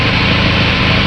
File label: audi engine; WRECKAGE